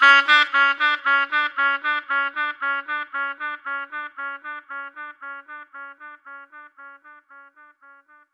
Dubby Sax; Sonic Foundry (Sony Creative Software); Jazz Solos and Sections; Effected Sax

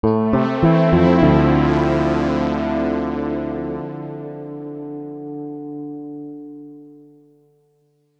D&B Pad Riff; Keys; neuro

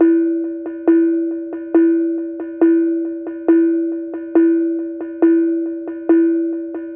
Bonang 138 C, Percussion Elements

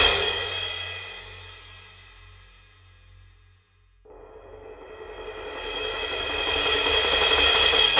Cymbal; Sonic Foundry (Sony Creative Software); Orchestral Series VOL-4 - Rock and Pop

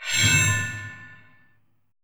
DETH SCRAP, FILM PERC; Drums In Your FACE!

